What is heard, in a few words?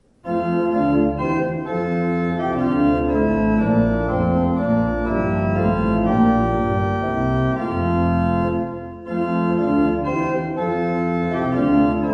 Simon